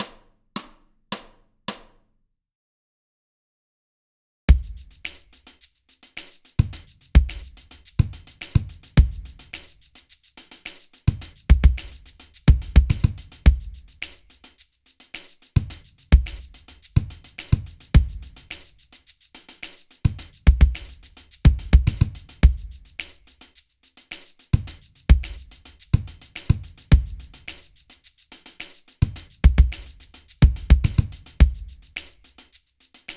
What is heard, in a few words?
sambini fakedrums